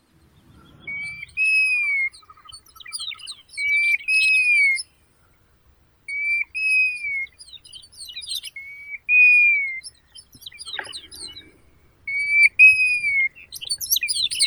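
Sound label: European golden plover, Pluvialis apricaria; rzhanka zolotistaya; zvuki prirodi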